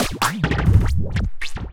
Glitch Loop 138 Zip

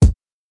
Kicks

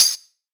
Hat

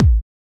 Kick Shot

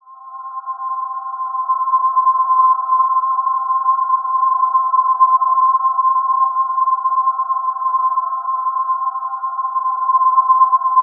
Atmosphere Sound C Pad; Sonar

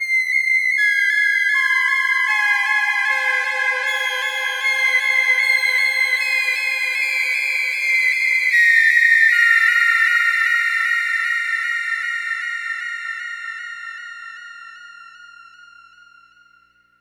CS80 strings high chord weepy Cmin

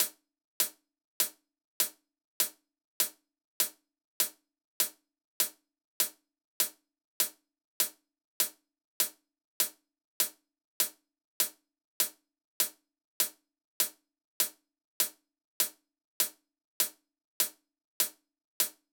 100bpm Hats

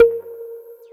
pong fx

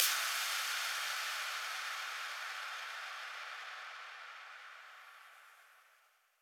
IMPACT

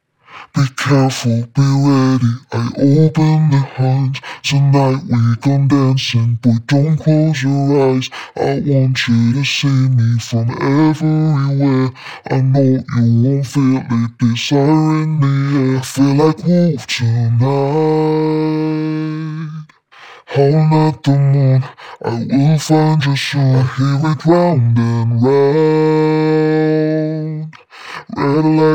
Vocal Octave DRY A-m

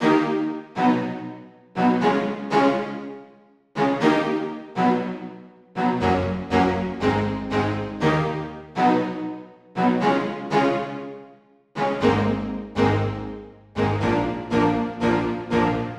Christmas Melodies 120bpm strings marc 120 E

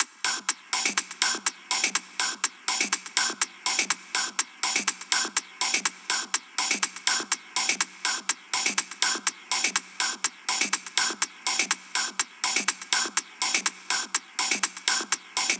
123 Top Loop

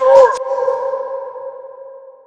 vox

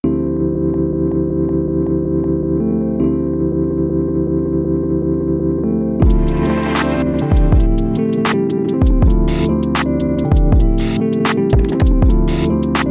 Lo Fly Drums

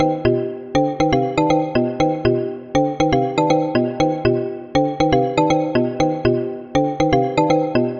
Chord 120 Cm

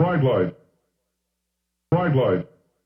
Vox

